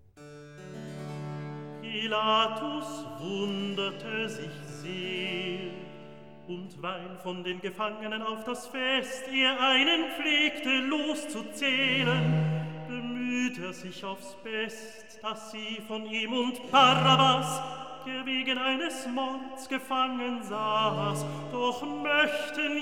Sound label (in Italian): Recitativo; Pilatus wunderte sich sehr; Handel; Brockes Passion